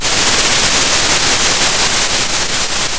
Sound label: pop, Sound of a thunderstorm